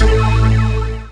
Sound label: chord A; Techno Trance Essentials; CHORDS